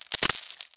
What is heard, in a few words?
siroma attack